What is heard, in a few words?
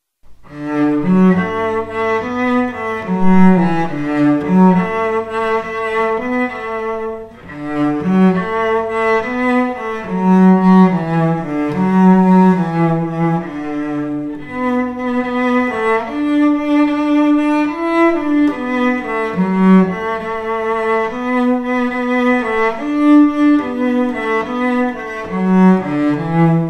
cello